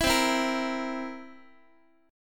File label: C 7th C, Guitar, chord; strummed; JGuitar